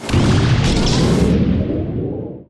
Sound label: space Ship Enter; spacewars